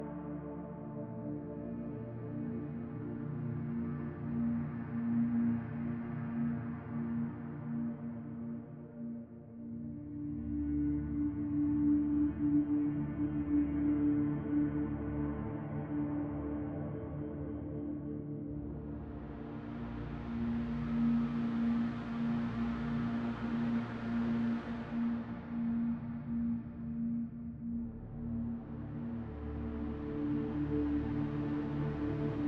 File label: background loop